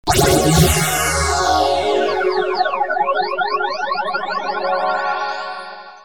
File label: Kawai s5000